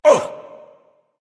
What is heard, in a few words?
surprise; chronos